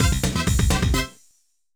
Jig; eigo de tabisuru little charo

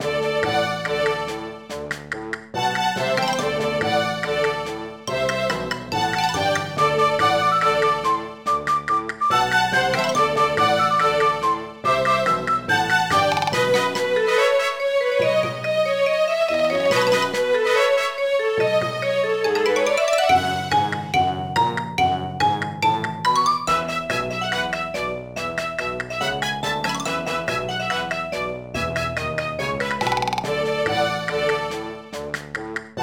Music Mini Game Action; asterix these romans are crazy 2009 nds gamerip

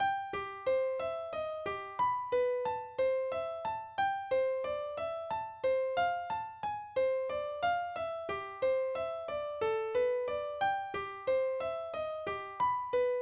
Splendid Piano gameover

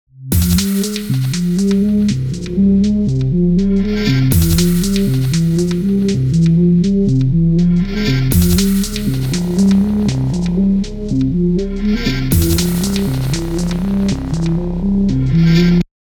boss section opening 120bpm 32beats; chicory a colorful tale; ps4

